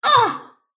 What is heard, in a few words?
sylph pain; dota; dark willow